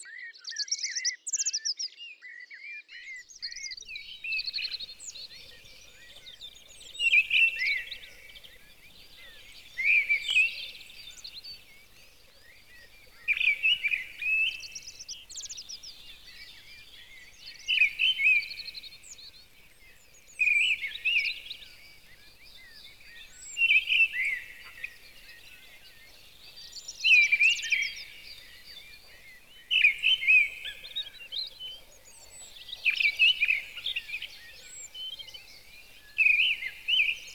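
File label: mistle thrush; drozd deryaba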